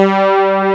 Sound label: acid bass; basses; The Sample Bank